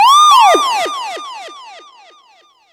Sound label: fx dub wail; M8; M8JUNGLEPAK1